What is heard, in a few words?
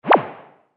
Outgoing SMS; ICQ6.5